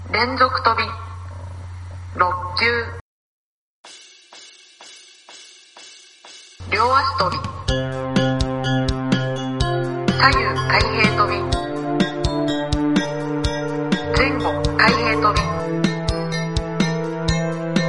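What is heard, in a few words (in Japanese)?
リズムなわとび 音源; rhythmrope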